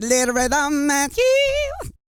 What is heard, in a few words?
SING, Vocal Planet R&B & Dance; ILIO